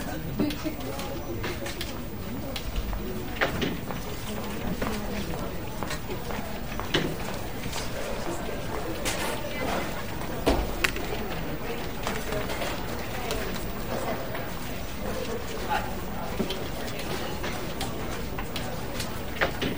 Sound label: ANC off office